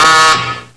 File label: buzz; wrestling